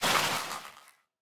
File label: slide, breeze; mob; minecraft